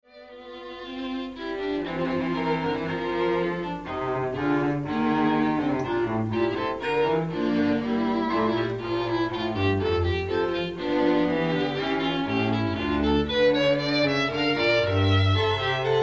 Fugue in F Major

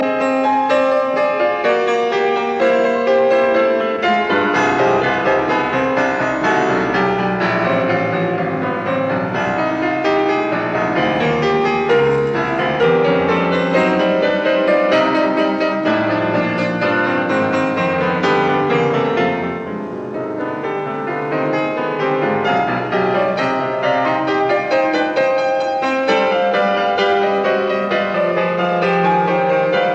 Variations in C major on a Waltz; 33 Variations; Op 120; Variation 32; Fuga

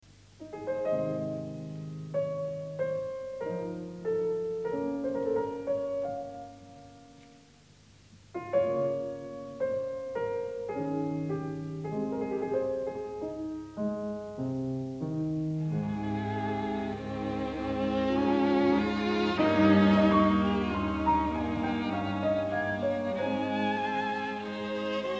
Piano Trio in e flat; II. Larghetto; Mozart

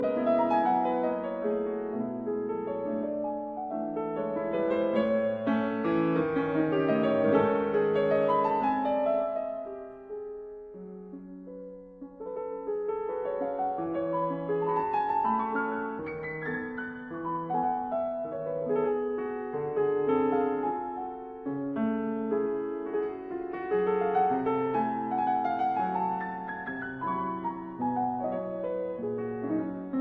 Mazurkas in E major Vivo; 9 Mazurkas Op 25 No 4

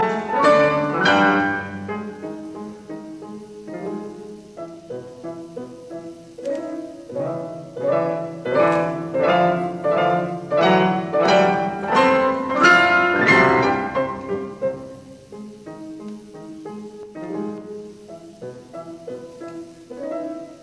Variations in C major on a Waltz; 33 Variations; Op 120; Variation 22; Allegro

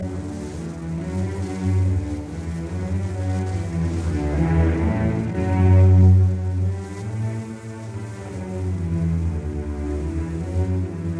Symphony in D minor Choral Allegro assai; Op 125; Arturo Toscanini

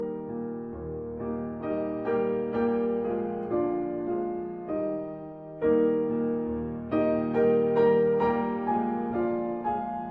Menuet in E flat major; Schobert

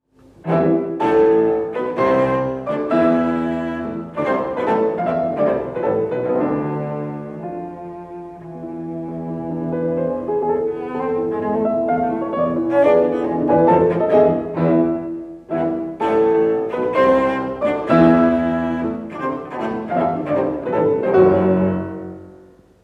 Cello Sonata in C Major Andante-Allegro vivace; Op. 102 No. 1; Beethoven

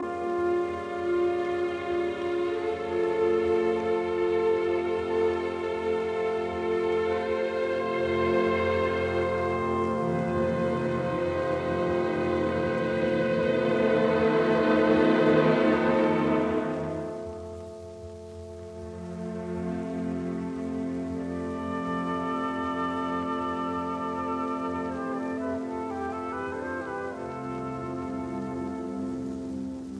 Symphony in C major; Sibelius; Op 105

